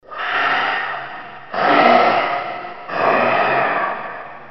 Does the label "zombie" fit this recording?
Yes